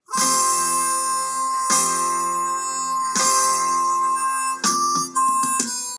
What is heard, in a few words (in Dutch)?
Muziekfragment Nederlandstalig